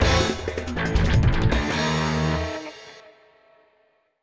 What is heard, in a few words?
Combat END; bolt 2008 pc gamerip